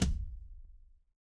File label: transition impact hit